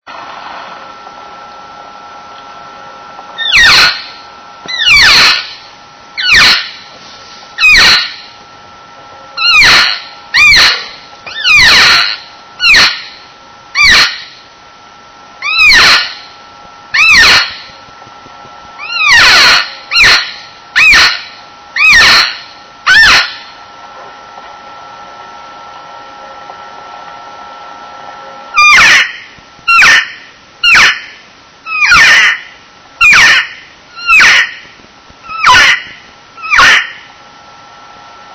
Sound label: cow, elk; elk 2001